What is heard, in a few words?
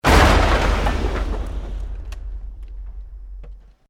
EXPLOSION MEDIUM WOOD